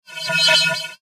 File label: Rollover Beep Tone Multimedia; Web Interactive; Beeps; Blastwave